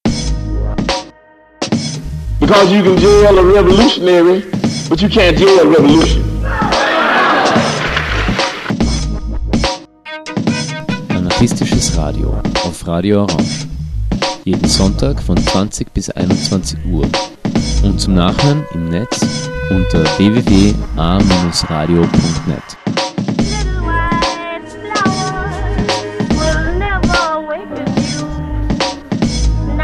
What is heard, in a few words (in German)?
Jingle; Anarchistisches Radio; Wake Up